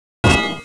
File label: gat unjam; wanted; weapons